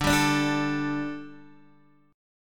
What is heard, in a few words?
D Suspended 4th D Guitar DADGAD